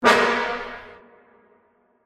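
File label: Sting Small Brass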